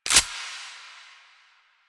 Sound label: Pistol Reload Slide Release; Weapons; Warframe; Tenno; Lasrian